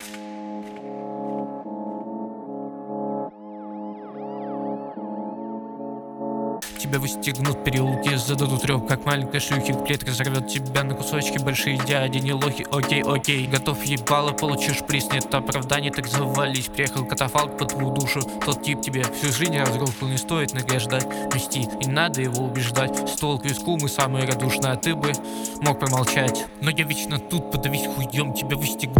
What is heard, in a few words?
Italian drill